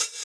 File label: Hhat, Hihat; Equipped Music; Loungin House